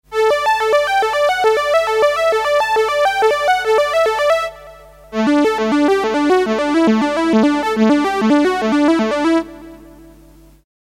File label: RS370, Polyphonic harmonic generator; Track 12 'Lead Time' Use of the RS390